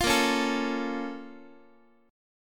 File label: A sharp Minor Major 7th, down, Guitar; A#mM7 strummed; JGuitar